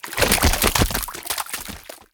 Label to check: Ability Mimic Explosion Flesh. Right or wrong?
Right